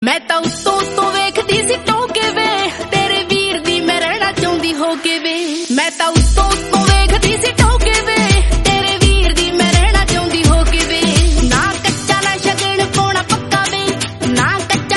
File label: Punjabi Song